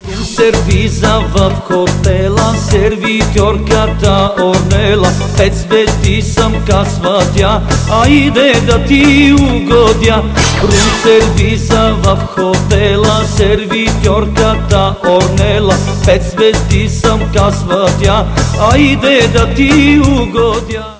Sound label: win